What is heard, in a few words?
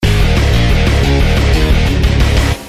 rocker sms tone